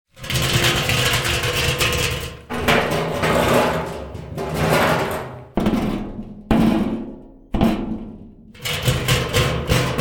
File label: metal oil drum impacts; zapsplat